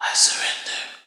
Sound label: Vocal Phrases; Vengeance Samples; Vocals